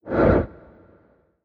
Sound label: gambler enter; DragonsLucky8WD; wazdan; ultrafast